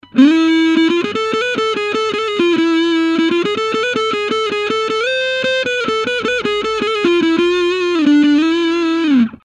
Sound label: solo; tweako